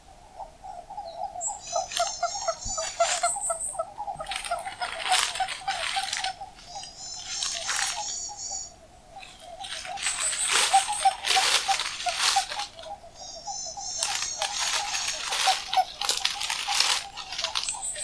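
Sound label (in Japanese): ヒキガエル, kaeru; doubutu; hikigaeru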